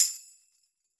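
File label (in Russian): тамбурин одиночный звонкий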